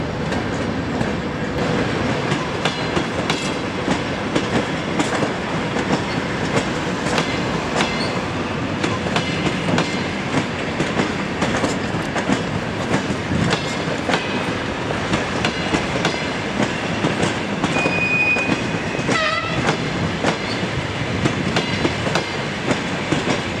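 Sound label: jd vokzal poesd proezjaet, train passing railway station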